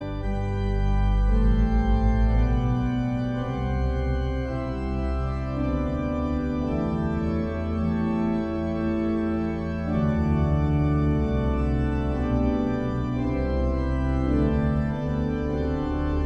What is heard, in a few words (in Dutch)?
Largo (Orgel); Handel